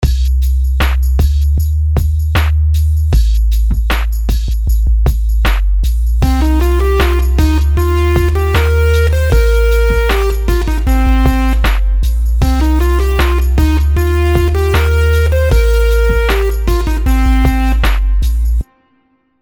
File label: melodies simple 16s Cmin; melody